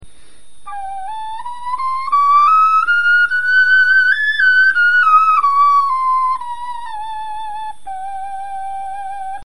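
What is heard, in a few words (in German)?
tonleiter, Knochenflöten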